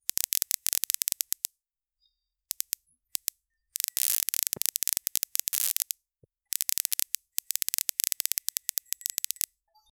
repair

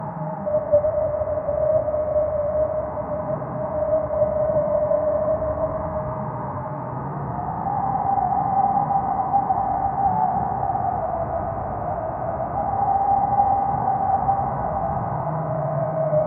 melodic frozen landscape

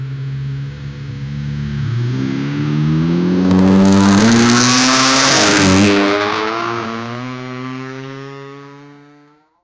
motorcycle tyres screechi

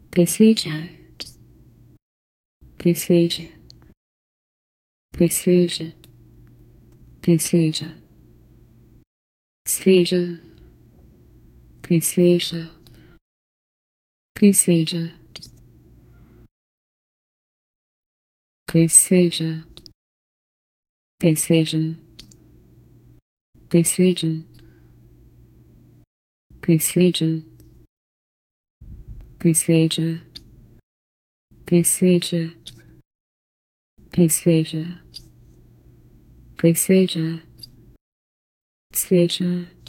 woman saying psssssssssss; human voices

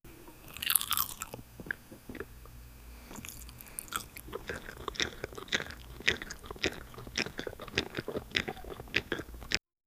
eating sound, crunching

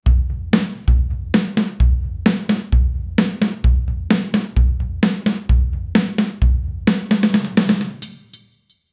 a drum set sound

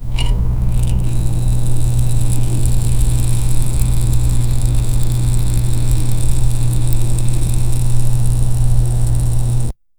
pushing wall; household

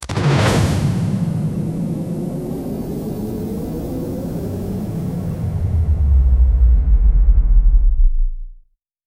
match 3 rocket power up

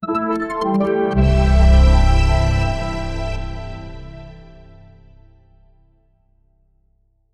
logo reveal no music only

